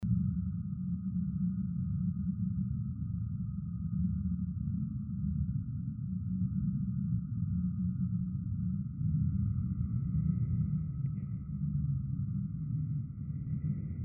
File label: electric gamelan DISTANT HUM FILLER